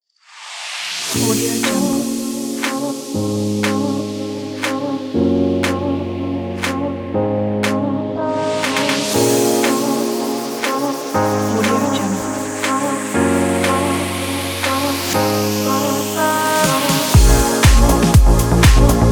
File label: deep house